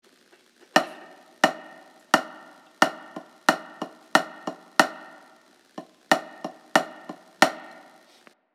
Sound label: Recall, Buglecalls